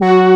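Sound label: brass G3, synthesizer, analog brass; AKAI S6000 CD-ROM - Volume 1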